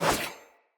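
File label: Sword Whoosh right to left; Weapons; Player; Swords; Fey Sword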